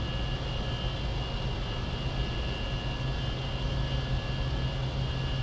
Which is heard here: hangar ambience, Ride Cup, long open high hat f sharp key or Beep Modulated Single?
hangar ambience